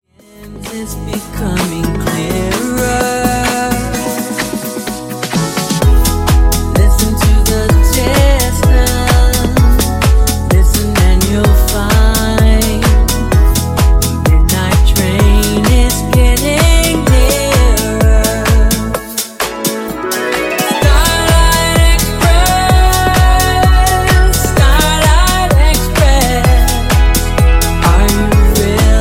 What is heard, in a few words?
Funk Bootleg 128Bpm; remixplanet